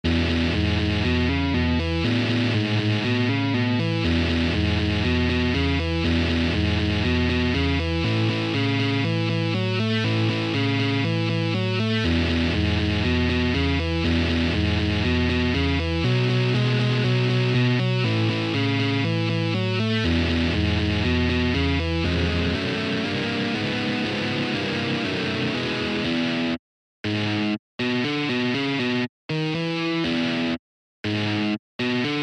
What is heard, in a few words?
Easy Shuffle